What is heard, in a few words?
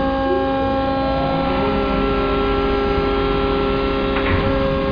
machine; Gunlok; environ